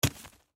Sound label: Hatchet Drop Dirt Axe; Axes; Tools; Blastwave